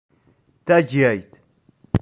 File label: Tornado; Tajyayet